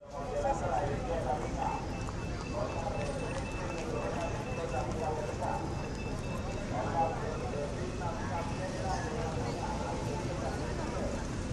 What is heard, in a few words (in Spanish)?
Alarma de semáforo